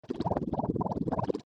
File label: warble gun, Ray Gun, Warbly; Short Warbly Burst . Clould Be A Strange Ray Gun , Could Be A Psychic Transmission From An; Laser; Lasers